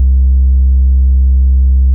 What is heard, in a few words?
Ultimate Bass Root C; Vengeance Samples